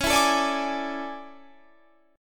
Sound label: A Major 11th, guitar